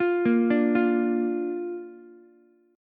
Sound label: A sharp Major, Ukulele; arpeggiated; 10,10,10,8; chord; JGuitar